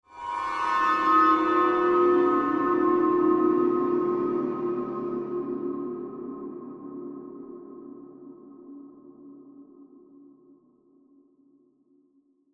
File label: Ghost Appearance, Horror; Ghosts; Blastwave; Sound Effects:Horror